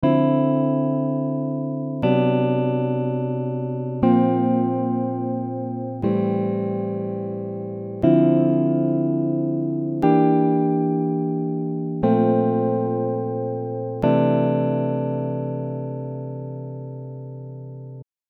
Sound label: Dm 120 GTR Jazz Chord Sequence